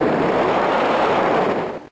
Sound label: Mud Shot